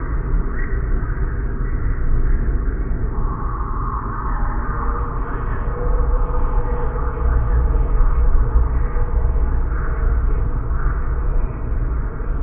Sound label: Deep Ambience